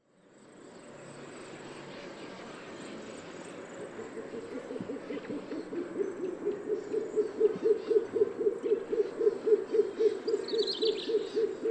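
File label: speckled pigeon Columba guinea; krapchatyy golub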